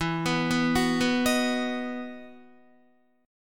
E Major 7th Suspended 2nd E, Guitar; arpeggiated; 4F,4A,5C,5G,5C,6E; JGuitar

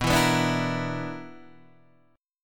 B-Minor Major 7th Double Flat 5th, Guitar DADGAD

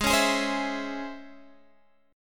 Db Minor Major 7th Ab

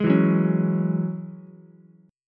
F sharp 7th Suspended 2nd, Ukulele; 1,4,2,4; strummed

